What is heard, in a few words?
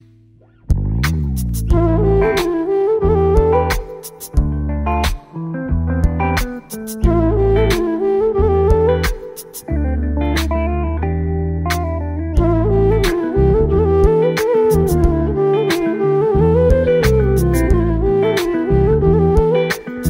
trending flute ringtone